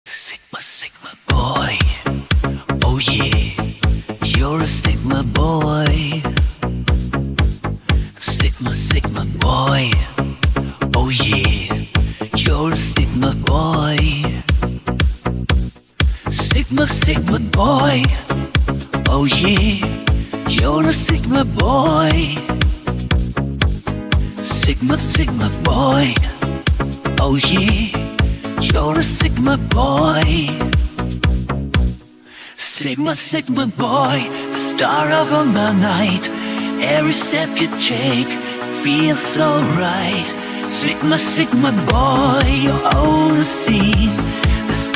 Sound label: ai 80s disco